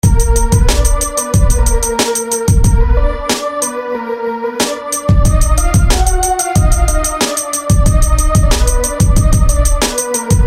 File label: Tusk Flute